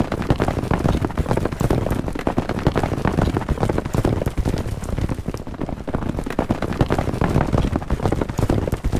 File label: Gallop